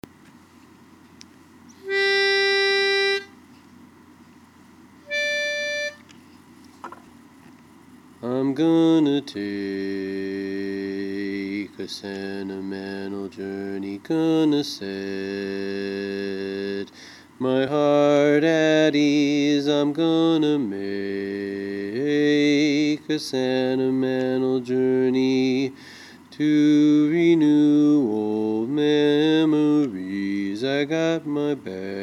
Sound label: bass